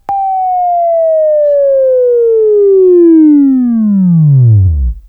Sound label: WAV Right, Sweeping; Sweeping Training program